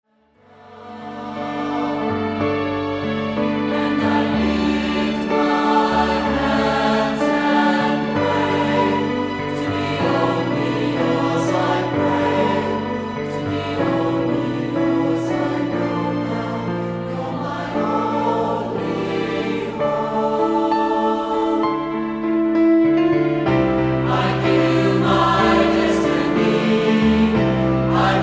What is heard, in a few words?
Pop Choir